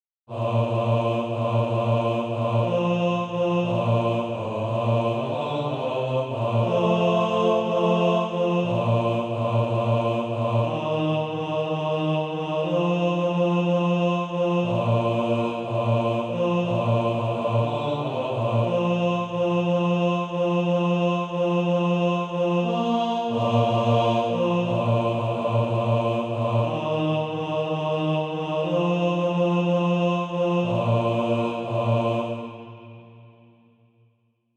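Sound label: ten, Practise Music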